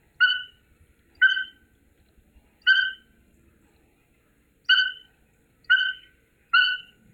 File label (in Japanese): inuwashi